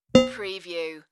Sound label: Pong